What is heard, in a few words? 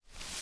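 Footstep Foliage Bush Small Reverse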